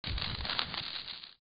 FUSE

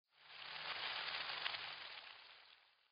Rain

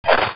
footstep GRAVEL

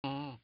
ohh

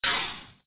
FUSE PUT OUT

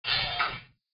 heli door

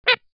pup bark

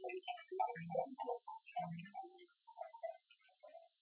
water drip fade out

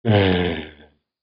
giant noise

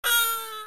baa small

